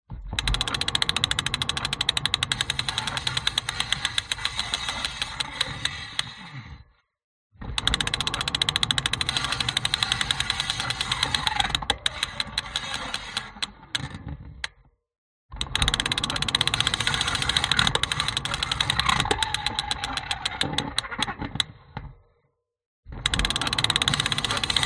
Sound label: contact mic ratchet broken bike wheel spin and brakes apply